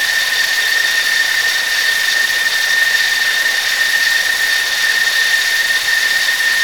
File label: Aircrafts Engines DB605 Whine; DCS